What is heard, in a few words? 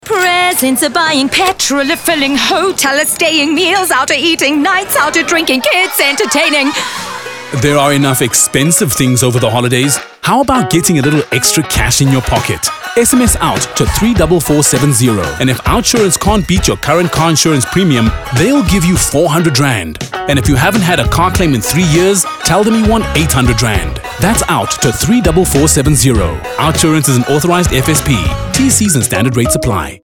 Singing; Outsurance